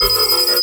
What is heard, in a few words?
sfx skill, ZombieSkill SFX; IdleRPG2024